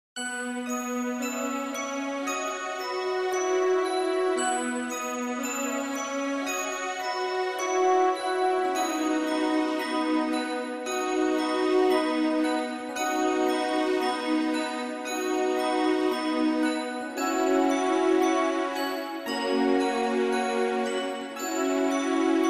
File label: Prologue NARRATOR Instrumental